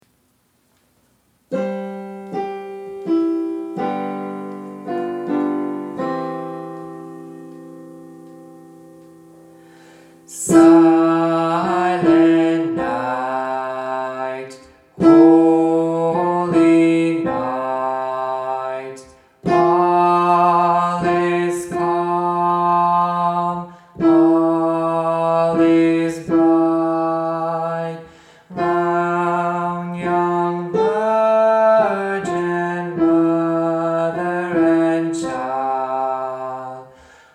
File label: Silent Night Alto